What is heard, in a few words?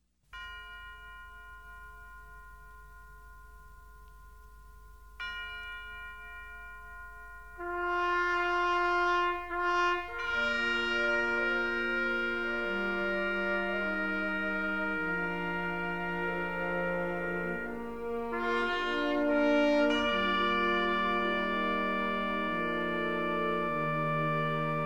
Taps Military Bagpipes Massed Bands Pipes Drums; US; Massed Bands Pipes Drums of the Welsh Guards; patriotic